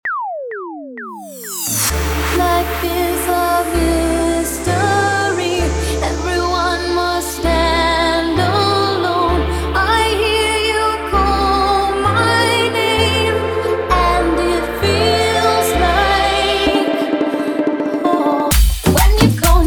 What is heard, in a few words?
intro outro 130 bpm